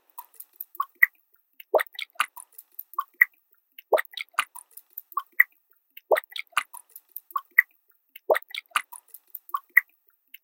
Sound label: Stirring Liquid; Geropharm BioDigitalLab